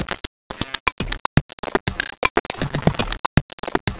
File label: Beat, Modular Electronica